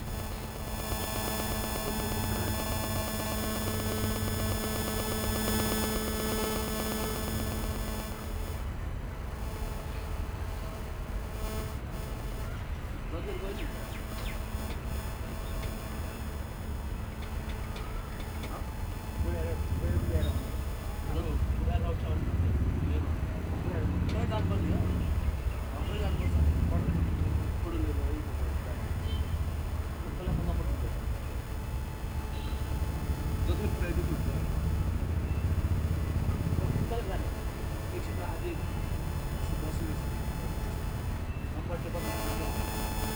Namo Buddha Rooftop, Boudha, Kathmandu, Nepal, fieldrecording